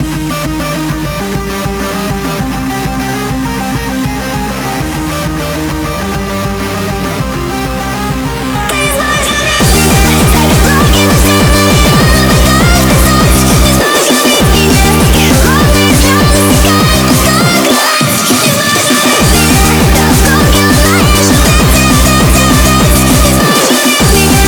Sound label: Hardstyle